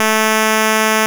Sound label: Pro 1 SQR A3, Vintage Keyboards; Big Fish Audio; First Call